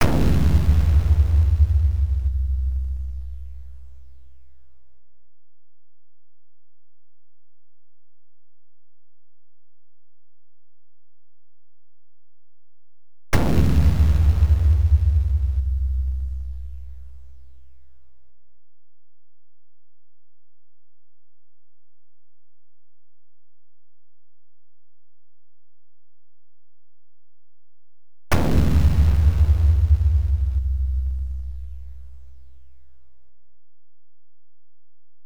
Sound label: Opening 1 (sound effects); Xak The Art of Visual Stage; MSX